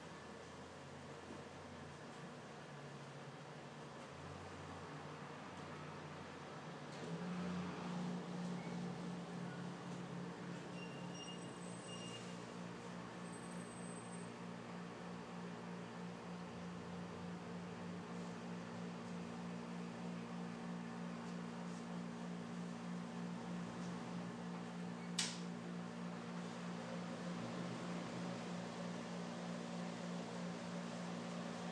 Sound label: silence in dorm room; at gwu